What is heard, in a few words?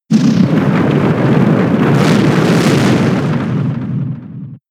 Earthquake; pokemon sfx gen 6 attack moves xy oras